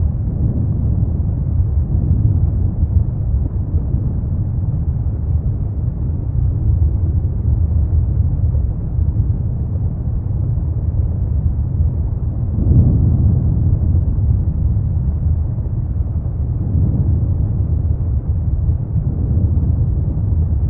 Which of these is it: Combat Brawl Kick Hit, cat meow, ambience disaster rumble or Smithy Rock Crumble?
ambience disaster rumble